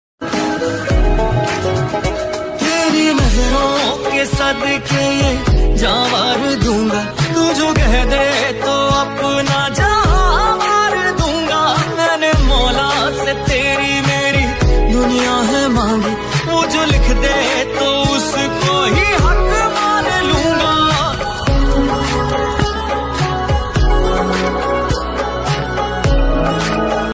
sad song ringtone

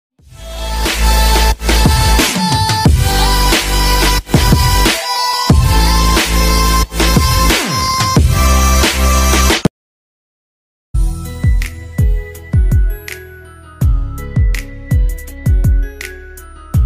instrumental ringtone best instrumental ringtone

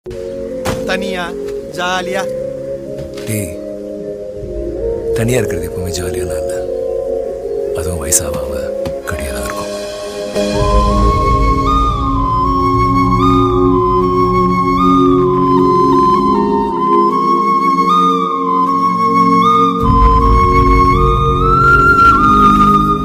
bgm tamil; mahaan movie

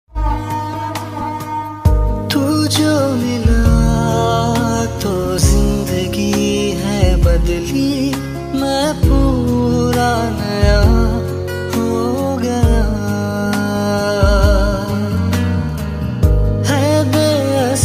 sad song ringtone

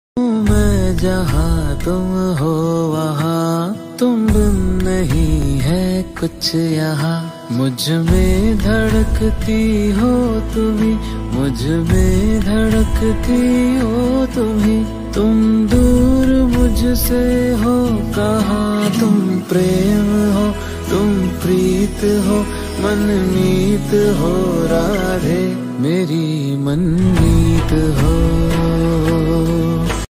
flute ringtone radha krishna flute ringtone

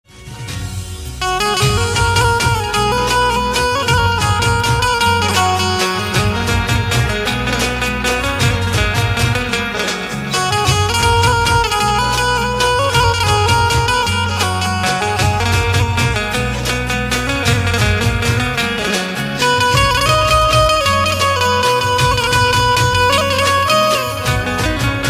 banjo ringtone gujarati ringtone music ringtone

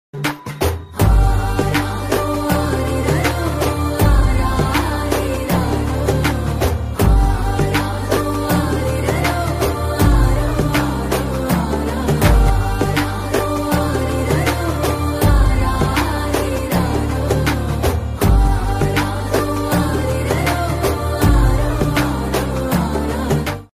BGM Ringtone; kanguva

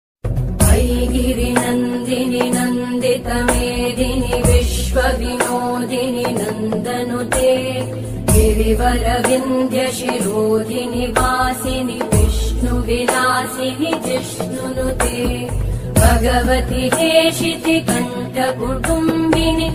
ringtone remix bhakti songs ringtone; kali maa

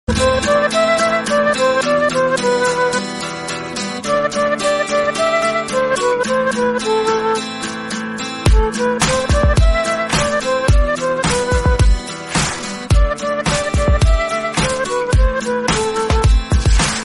song ringtone flute version

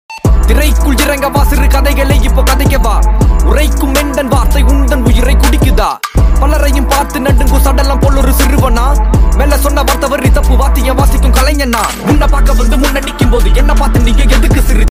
Tamil rap song; biggboss

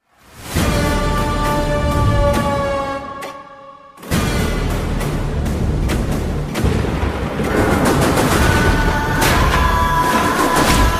bgm ringtone tamil bgm ringtones

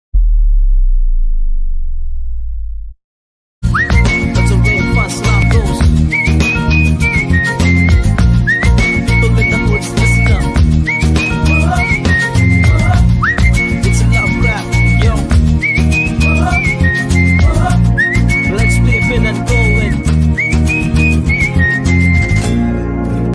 whistle music ringtone

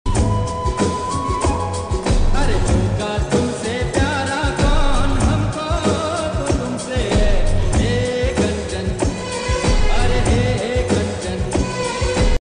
ringtone; instagram viral songs; mobile ringtone

